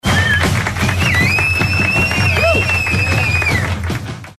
chiflidosss

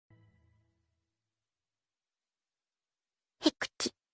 estornudo kawaiii

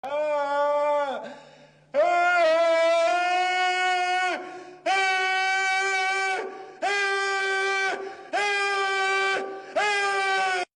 gritos de chaval especial

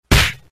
golpe

